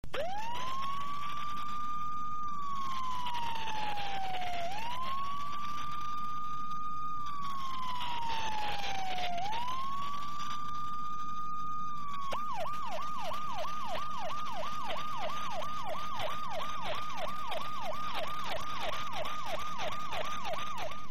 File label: Azhire police